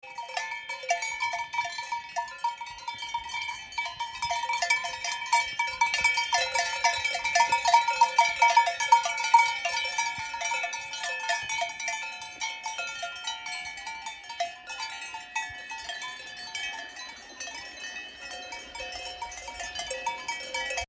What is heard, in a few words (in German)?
Kuhglocken